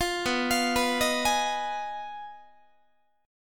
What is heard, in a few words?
B 6th Flat 5th, down, Guitar; B6b5; arpeggiated; 20,19,20,21,19,19